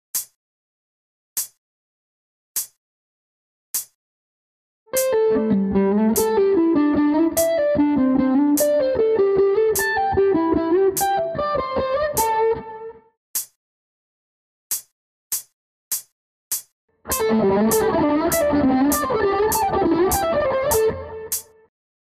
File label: penta Slide